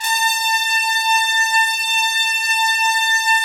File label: BRS TPS, Trumpets; Roland - LA Composer vol. 4